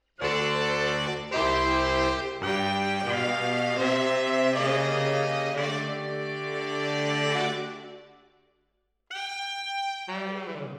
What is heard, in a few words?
MKH AB, piano